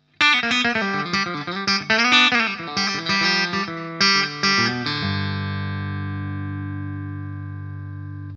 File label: Custom 22 Steg clean singlecoil; PRS S2